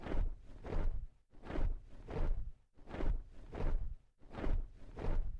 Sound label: flapping wings wings